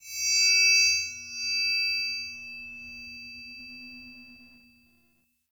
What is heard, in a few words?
Witch Station Chime Jingle; Ambience; Soul Plane